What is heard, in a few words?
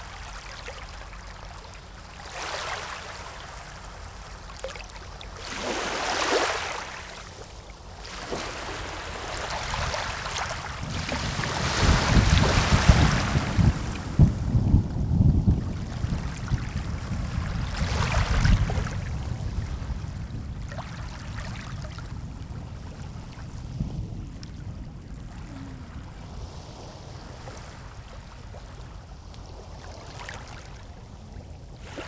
Thunder stereo; CARKEEK